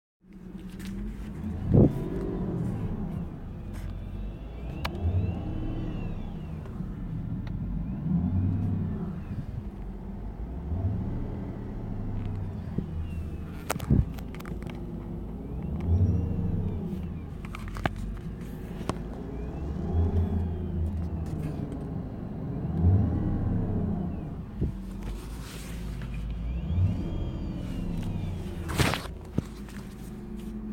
whistling noise dashboard area; jag noise

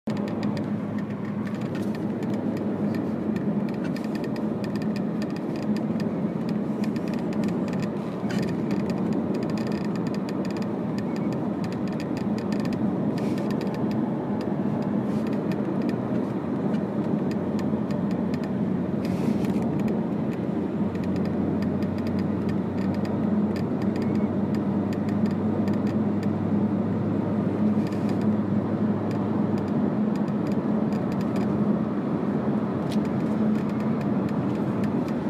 rattling rear hatch cover jag rattle squeaking

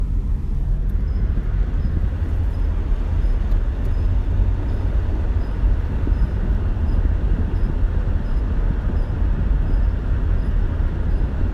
wheel sound; x-type x400

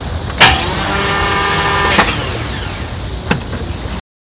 no start xj12; x305

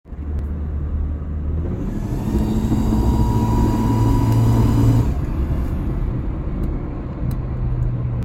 th400 trans whine, Jaguar XJS; xjs x27

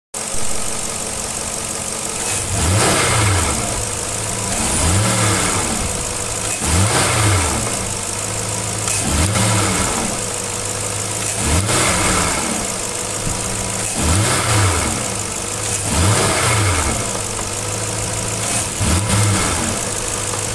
xk8 4 0 bogging down; XKR X100

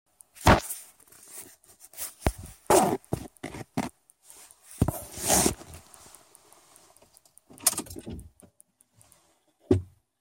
new noise, x-type; x400

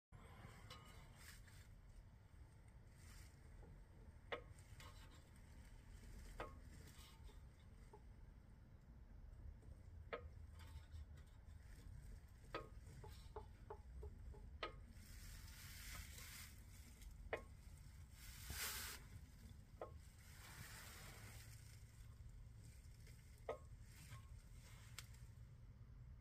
engine knocking sound; XJ XJ8 XJR X308

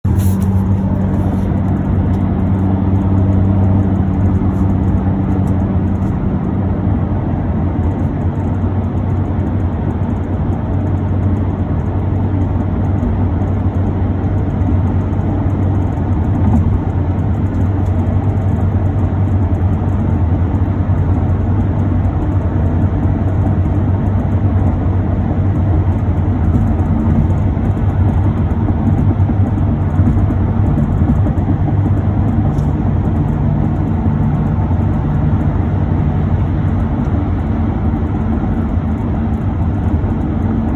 tapping sound f type tapping sound

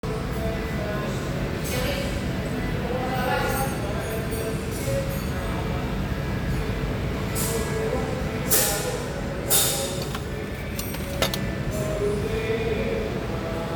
heater core, Jaguar XK; evaporator core; xkr x150

